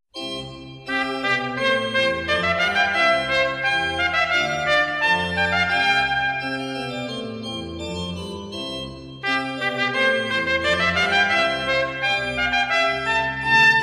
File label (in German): Sonata, trompete u orgel; Giovanni Bonaventura Viviani